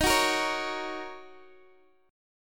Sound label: Eb Minor 7th Eb Guitar Open D